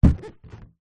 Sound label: balloon inflated catch; foley